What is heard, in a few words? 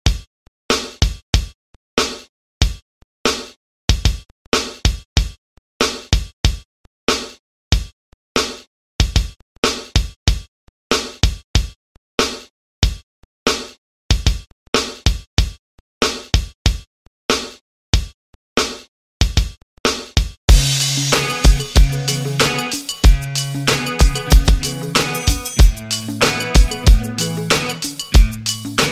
80s Redrum 94Bpm Clean